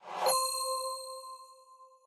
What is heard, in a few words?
Win Balance Update; Real Dealer European Roulette Classic